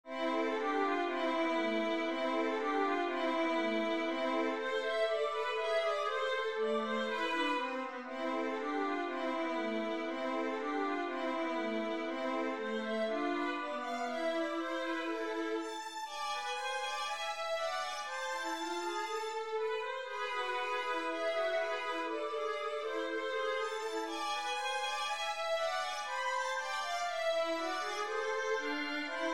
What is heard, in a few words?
etude, violin